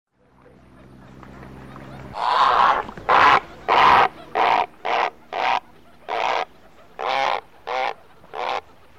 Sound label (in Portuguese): garça moura